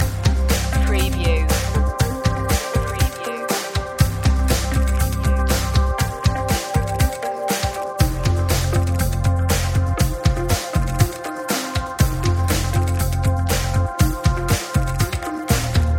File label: electro rock groove loop